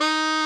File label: SAX XSAX, Saxophones; All Saxes